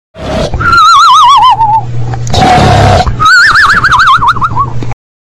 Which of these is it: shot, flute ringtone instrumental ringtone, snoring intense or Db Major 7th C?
snoring intense